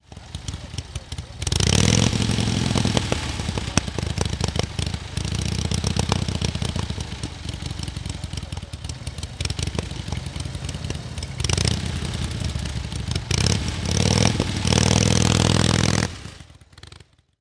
Indian Scout 600

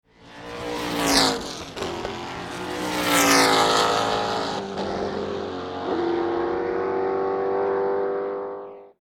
Formula 5000 pass; F5000; Unknown F5000 Pass; 2003 Skope Classic; Ruapuna Raceway, Christchurch, New Zealand

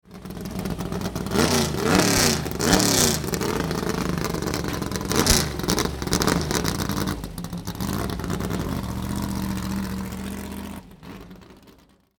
Mustang; 2003 Skope Classic Ruapuna Raceway, Christchurch, New Zealand 1-2 February, 2003

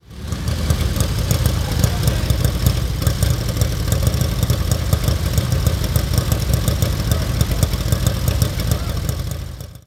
Harley-Davidson Servi-Car

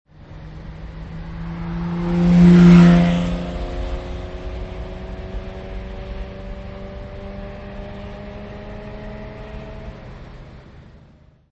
Ferrari Testarossa pass

